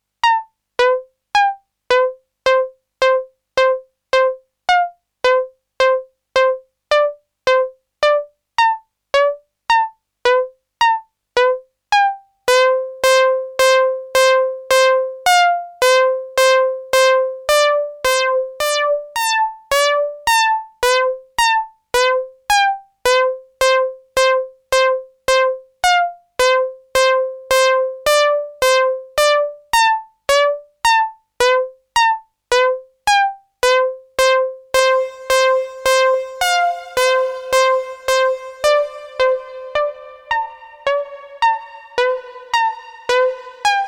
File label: Crave Bm Pluck